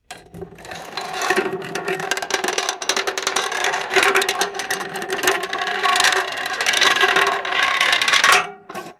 metal can on wheels dragged and rattling

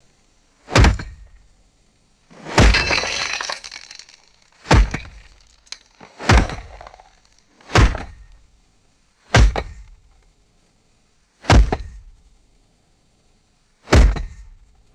heavy sledgehammer striking a cinder block; rocks

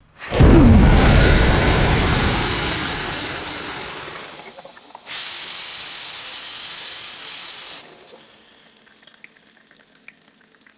liquid burst with sizzling and dripping

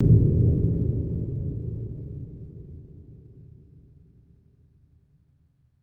heavy dramatic metallic impact with long reverb; designed

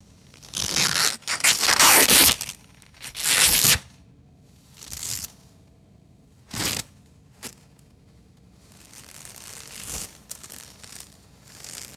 large green leaf being ripped; vegetation

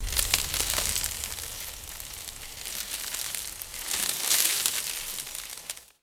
heavy dry foliage crackling and rustling; vegetation

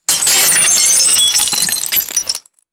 large piece of glass snapping

